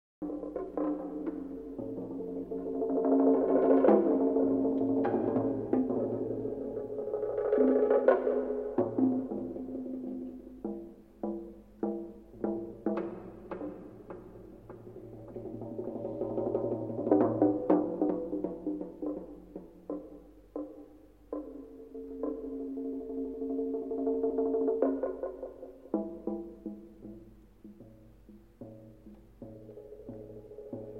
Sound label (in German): Zwischenspiel Perc.; Stadien 3; 1976